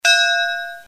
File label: gong